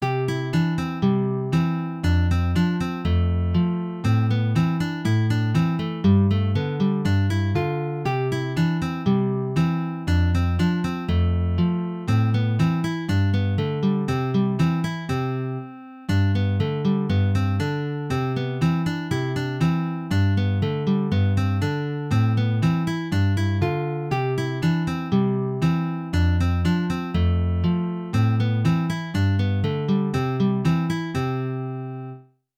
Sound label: Picking